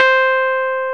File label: piano, E-PIANOS MKS-20; Sound & Vision; Gigapack I CD 2